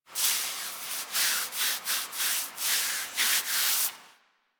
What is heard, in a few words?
foley interact scratch lie alert forward; Enemies; Warframe; Catbrow